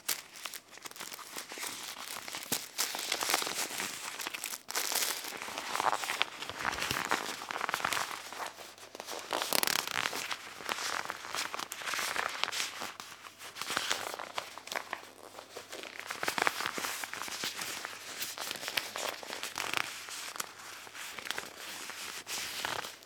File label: Rustling Plastic